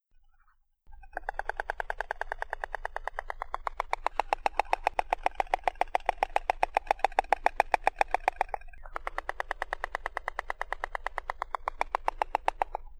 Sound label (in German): Storch; storch-nest